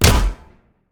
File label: pot